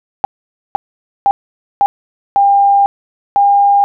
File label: pulses